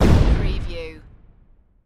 Explosion Grenade; Action, Impacts & Explosions

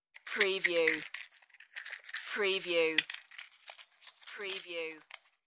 Matchbook

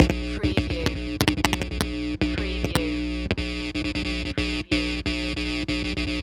Electric Stutter Crackle

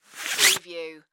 Machete Sheath Remove

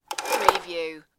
engine start belt

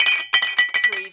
CARTOON GLASS BOTTLES RATTLE

